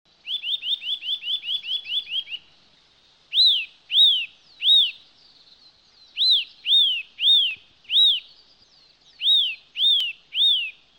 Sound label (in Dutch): Boomklever; broedvogels